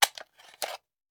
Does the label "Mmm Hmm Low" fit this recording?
No